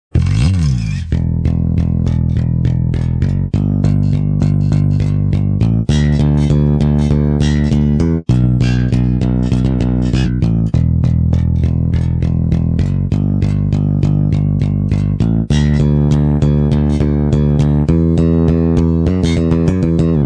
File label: Bass